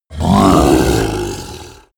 orc growling; kreaturen

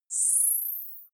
zoom out, signale

